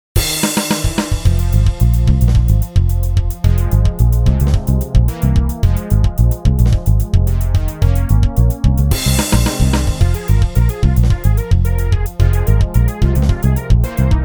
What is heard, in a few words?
game music